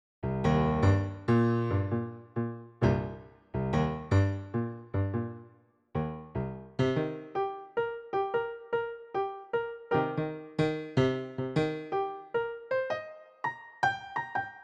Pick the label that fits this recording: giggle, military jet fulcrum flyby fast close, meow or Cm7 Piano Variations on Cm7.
Cm7 Piano Variations on Cm7